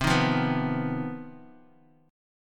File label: C Minor Major 7th Double Flat 5th C down Guitar 4F 4A 5C 5G 5C 6E; strummed; JGuitar